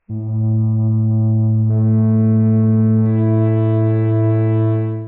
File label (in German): natur; Naturtonreihe; F, 2F, 3F; die ersten drei Teiltöne der Naturtonreihe